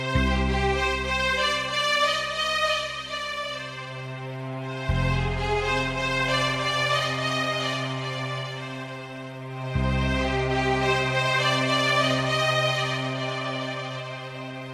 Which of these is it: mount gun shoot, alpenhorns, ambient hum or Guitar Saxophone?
Guitar Saxophone